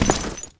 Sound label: jump concrete